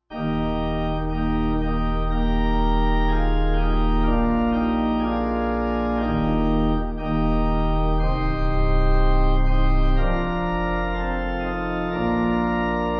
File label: Now From The Altar, Naomi, Pipe